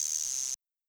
Guiro, Roland TR-77; Drums

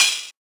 Tamborine, 707; classic machines; 707mod

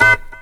chord A; Techno Trance Essentials; CHORDS

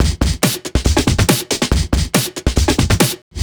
Break Beats, 140 BPM

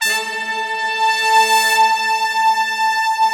big brass, Slow Big Brass

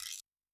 Guiro; Yamaha DTXpress-II; Perc

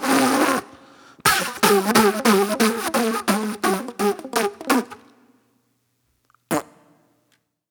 Club Flute

